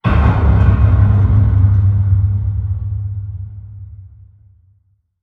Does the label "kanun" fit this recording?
No